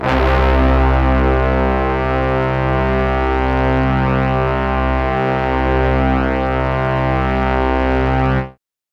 Brass Section loop

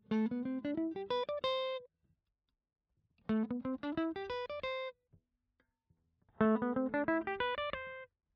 clean All positions Melody; Cort MBM 2H

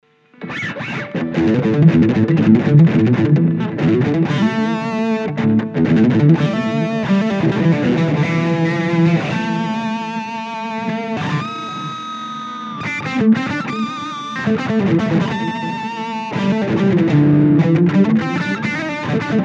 Blackstar LT Echo 15

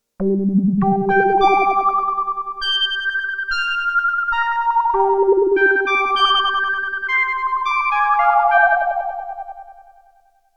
Marion Systems MSR2 Filter LFO

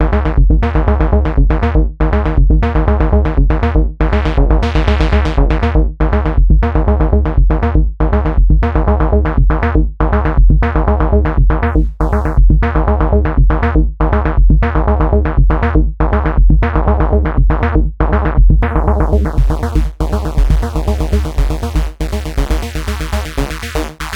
Oberheim SEM Filter Modulation via Velocity; G-Force Software

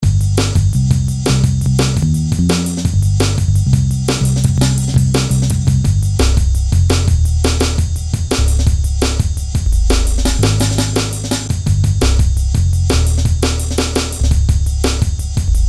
Drum Bass

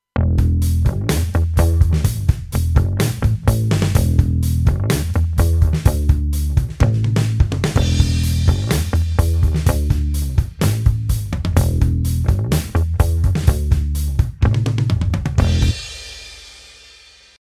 Drums Bass original

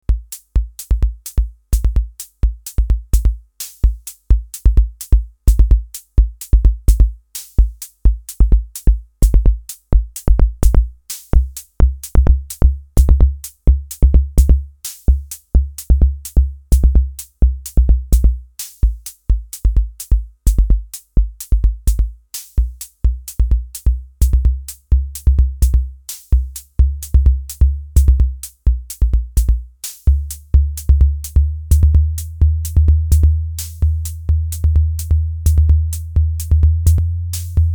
AVP MAD-5 Bassdrum

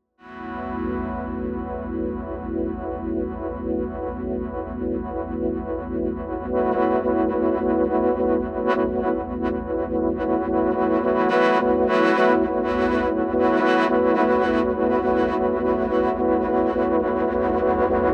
Sweep Pad

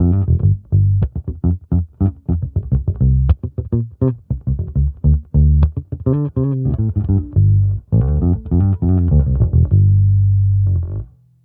Fingerstyle DI

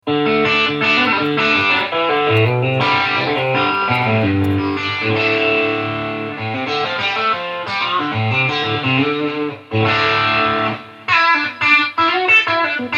British 80s

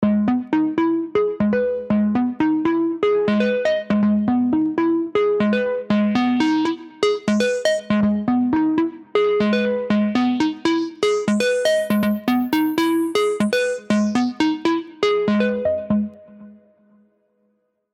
Arturia V Collection X Mini V Echo; Kraft

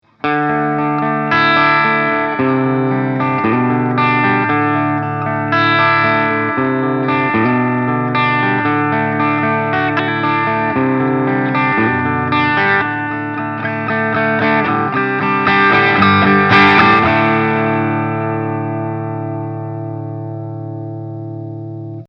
driv in gain 09 rest 12h

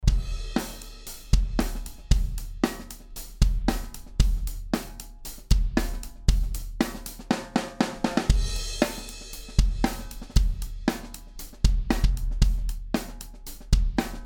Kick Trig Pork Pie Custom

